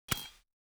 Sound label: lock Closing